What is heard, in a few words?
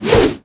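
Attack One Mace; Seer; GamigoNA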